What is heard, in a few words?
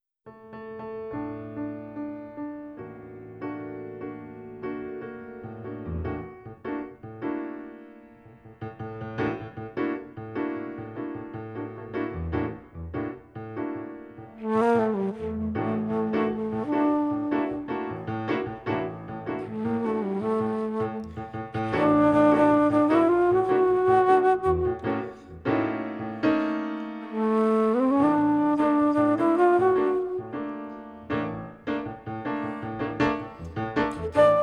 Piano, Alto Flute, Pandeiro